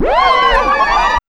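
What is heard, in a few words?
scratch; E-Lab; X Static Goldmine 1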